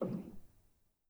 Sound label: rel, Grand